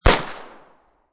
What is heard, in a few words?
MP5 9mm Field, Machine Gun; MP5 9mm Field Fire; Machine Guns; VRSonic